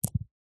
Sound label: industrial metal ball bearings x2 down on carpet